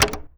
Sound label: MECH OFF; AKAI Anime Sound FX